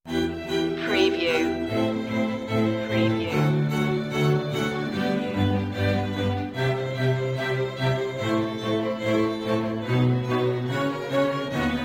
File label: strings dbl quartet mix; classical traditional